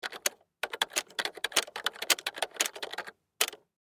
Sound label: Computer Keyboard Typing; Technology; Offices